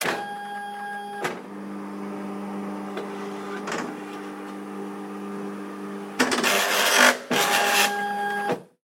zvuki kseroksa

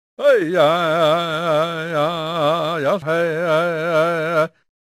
zvuki shamana

